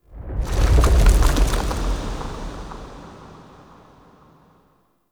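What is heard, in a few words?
Distant Explosion Debris; Warframe; Ambience; Nokko Colony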